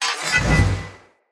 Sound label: Sword Whoosh Accent Heavy; Weapons; Player; Swords; Fenn Jotar Sword